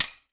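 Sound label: bamboo stick; Voice Crystal - Steve Reid Definitive Percussion; BAMB+DWL STK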